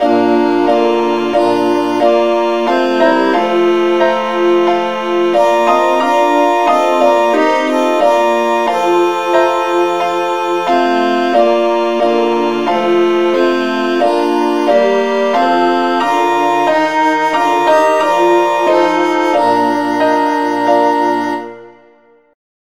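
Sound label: satb key B flat; saintthomas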